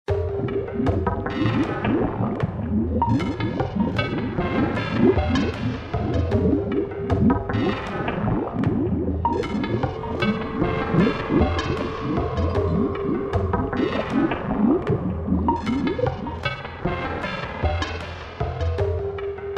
Ambient

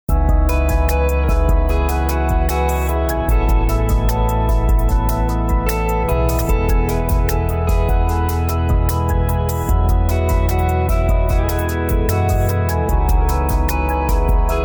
Rising Light Ambient

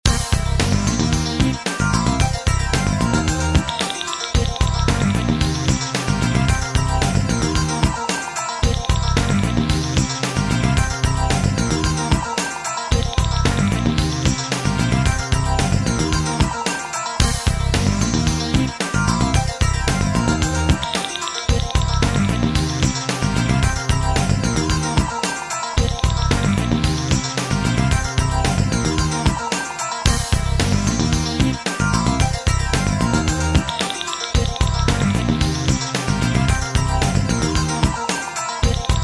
Corporate

